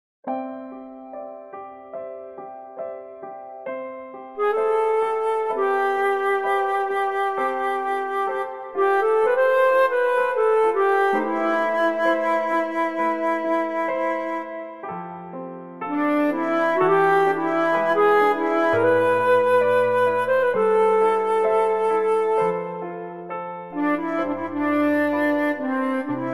C, flute

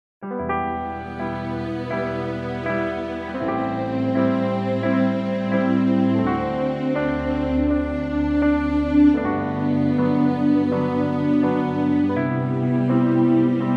Em play violin

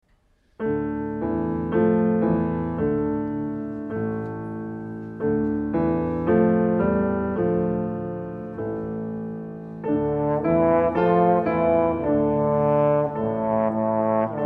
tbn

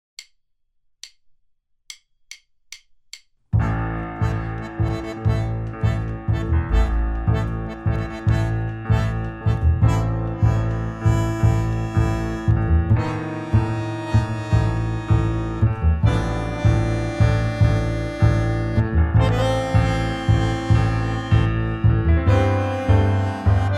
trio

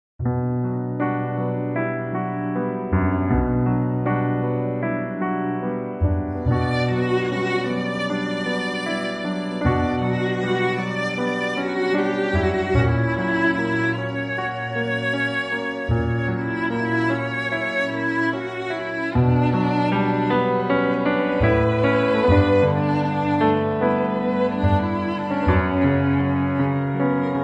Bm play violin

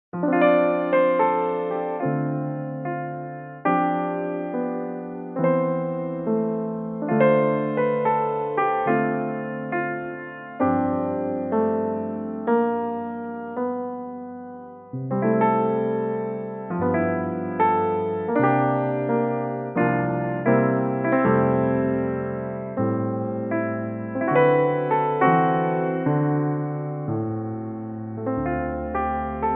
piano

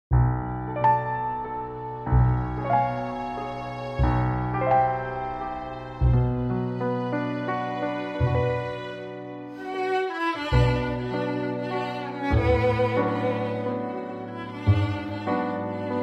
Bm play violin reference

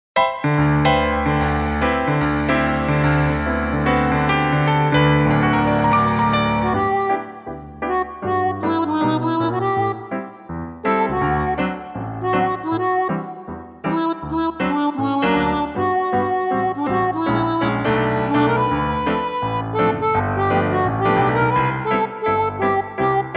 piano armonica reference

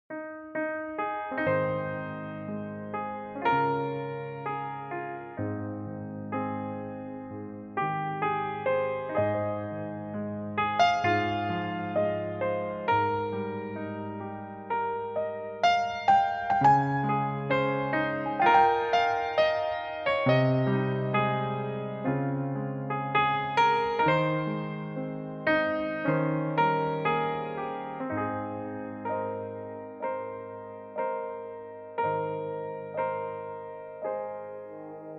piano cover Horn reference

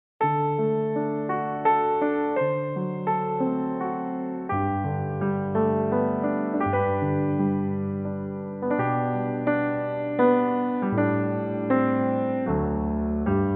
G cello

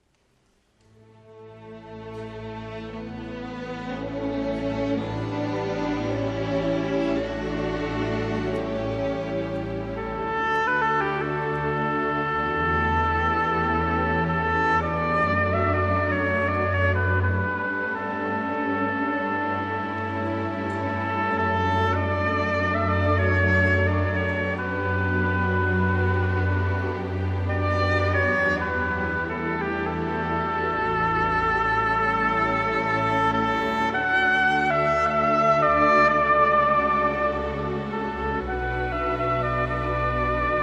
oboe D

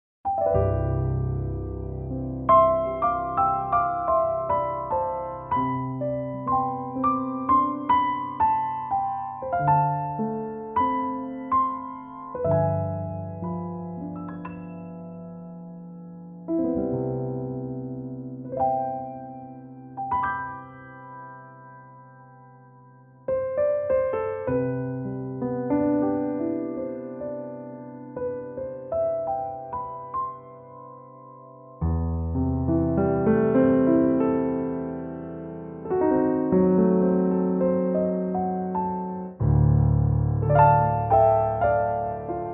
C piano play

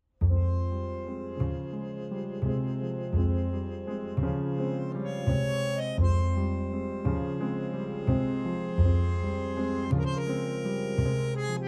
Fm orch tbn

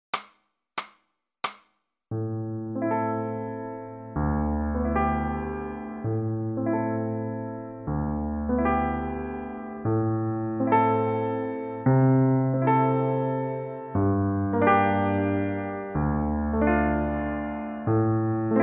piano solo

